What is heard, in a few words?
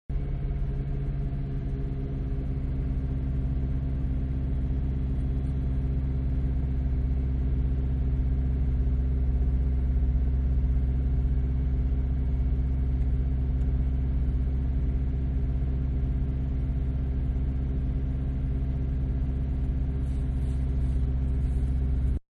Mid Size Car Idle Interior; Vehicles; Cars; Manhattan Production Music